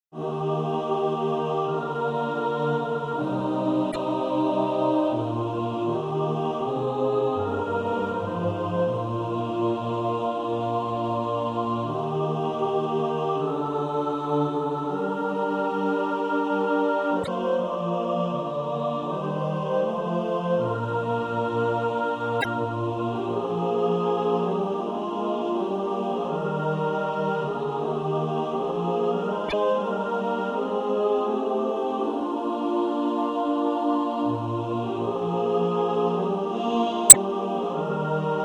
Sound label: mixed